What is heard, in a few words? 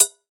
light hat C# key, Royality free pedal hi-hat sample tuned to the C# note. Loudest frequency: 7894Hz; hits one shots; hat samples; C sharp